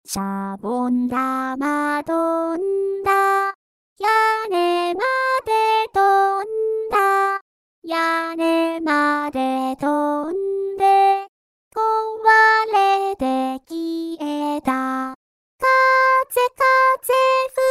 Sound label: Pitch Correct